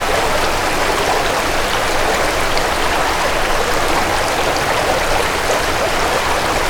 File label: water river fast; sound effects one